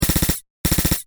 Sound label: space machine gun fires short burst; sound-ideas